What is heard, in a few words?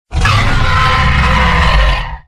indominus sound ueueuw